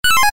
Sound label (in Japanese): ミス 4連 レトロ